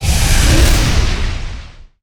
Attack

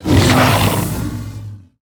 Stegadon Attack

